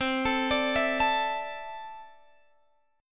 C 6th Add 9th, C, down, Guitar, Open G

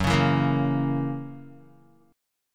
Gb 7th Gb down Guitar; Gb7 strummed

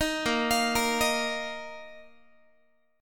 Bb Suspended 4th Bb down Guitar; arpeggiated; tuning 4A,4E,5A,5D,5G#,6C#

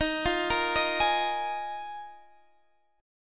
D sharp Suspended 2nd Suspended 4th D sharp; D#sus2sus4; arpeggiated; x,18,15,15,16,16

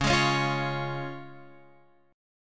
C sharp Major, Guitar Open F; x,8,8,8,8,8; strummed; JGuitar

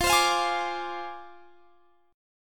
F Minor 6th F down Guitar Standard; Fm6 Chord; strummed; JGuitar

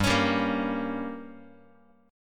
G Diminished, Guitar; strummed; JGuitar

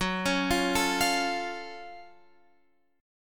F sharp Minor Major 7th, guitar; chordshape; down

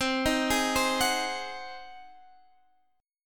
Ab 7th C

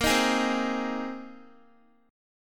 A sharp 7th Suspended 2nd Suspended 4th, Guitar; A#7sus2sus4; strummed; x,13,15,13,12,x

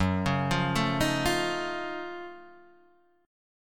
F sharp Minor Major 13th, Guitar, Open D

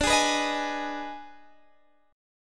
Eb Major 7th Suspended 4th Sharp 5th

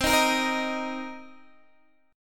C Minor 7th C Guitar Open D

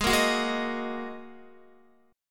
Ab 7th Suspended 2nd Ab, Guitar; x,11,8,11,8,10; strummed

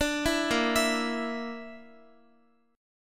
Bb Major Flat 5th Bb down Guitar, chord; arpeggiated; JGuitar

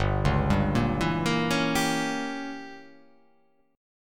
G Diminished 7th

